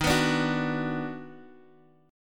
Eb Minor Major 7th Sharp 5th Eb down Guitar Half Step Down, chord